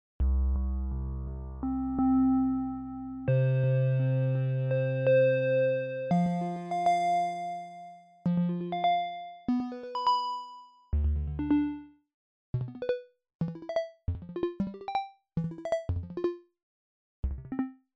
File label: Pedal Steel Strum; NordDemo